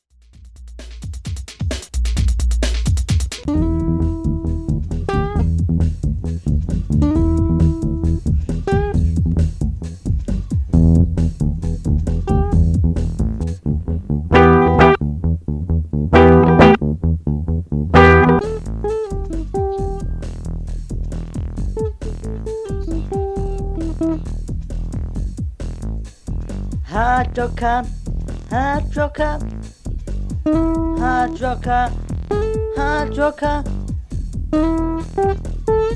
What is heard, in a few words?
HardRocker